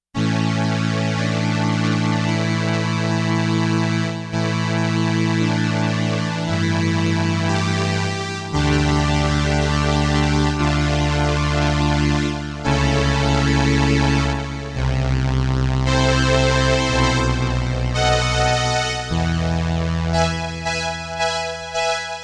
STRINGS I with vibrato, sustain, and Ensemble I, Roland RS-202